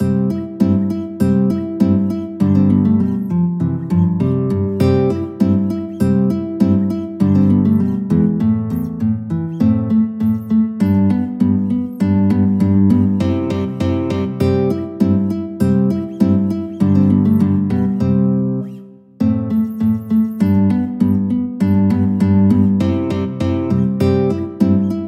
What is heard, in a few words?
Turkish March guitar; Beethoven; Ruins Of Athens Op113